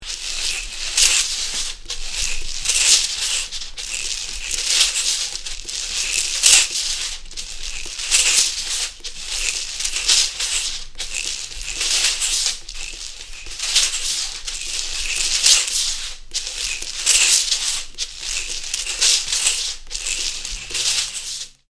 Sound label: HOOPLA HOOP tone